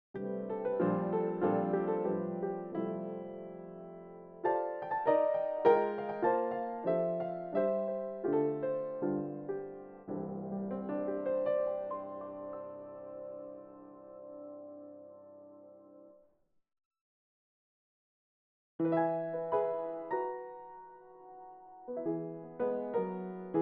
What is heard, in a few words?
Kontakt Factory Library Ragtime Piano